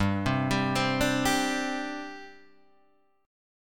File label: G Suspended 4th, Guitar, Half Step Down